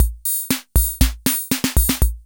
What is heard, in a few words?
FILL, KR55; INIS - Funky Xtreams; KR55 LP2